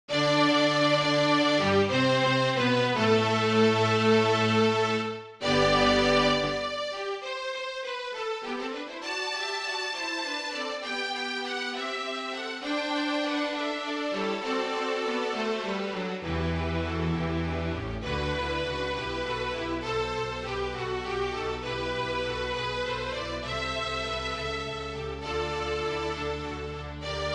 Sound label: strings